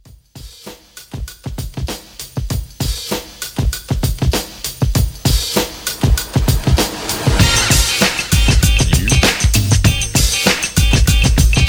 90s RNB Re Drum

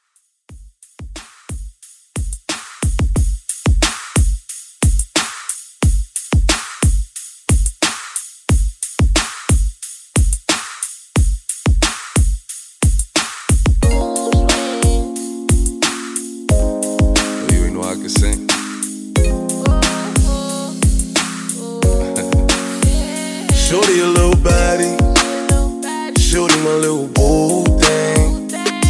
Top40 Redrum 90 Bpm

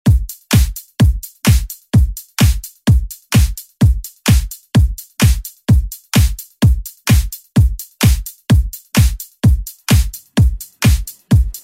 Hip Hop Redrum; Deejay Hit

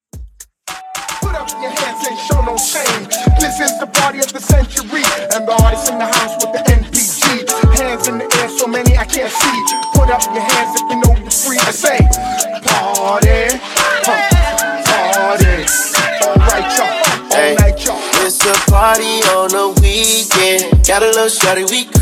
Club Re Drum With Hype In Outro 110 BPM

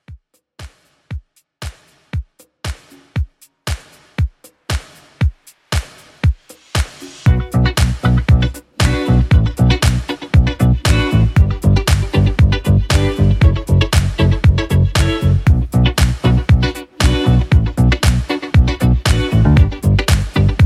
80s Dance 117; Redrum

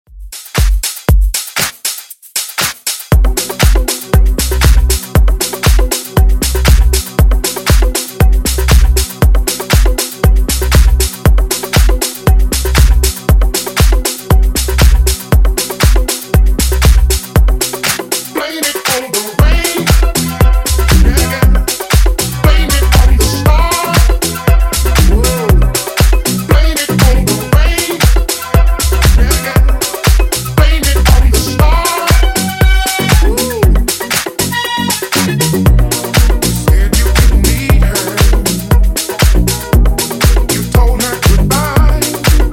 Funk Nu Disco House, 120Bpm

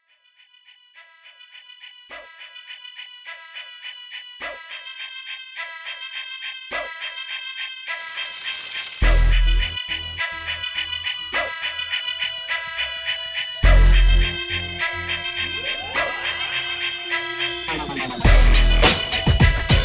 HipHop Dubstep 104 BPM

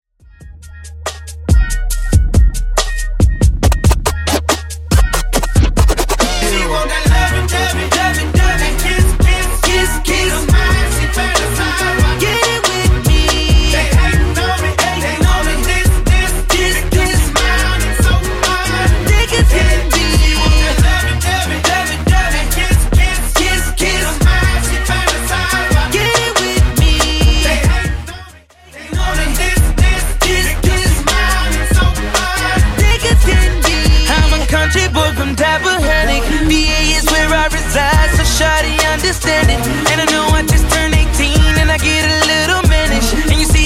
2ks DJ Friendly Redrum 70bpm

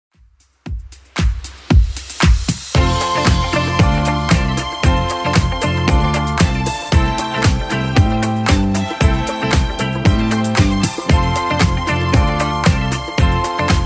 80s Club 115bpm

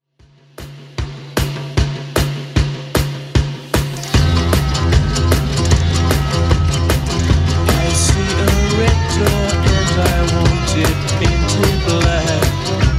60s Redrum 152bpm

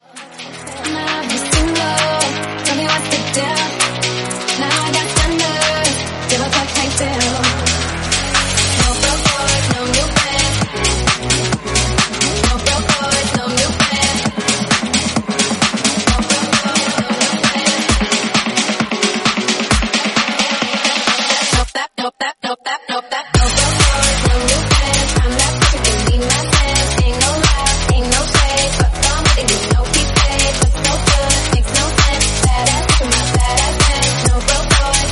132bpm Abm Tech House

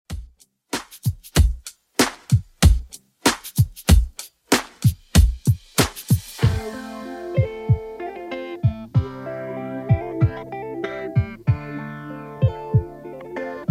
2ks Redrum 95bpm; remixplanet

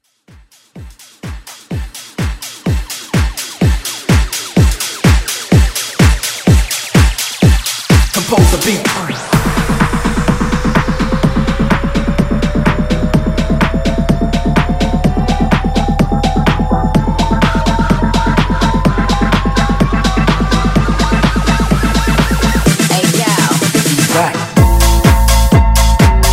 House Dub Mix

